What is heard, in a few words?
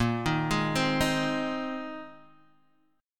A sharp 7th Suspended 2nd Suspended 4th